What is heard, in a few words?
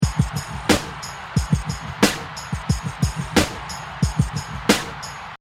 Hip Hop Drum Loop 90 Bpm With Crowd; Drum Loops; Colosso; LYN118 pack of Hip-Hop 90 BPM